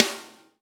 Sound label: snare, drumkits; hydrogen; baseKit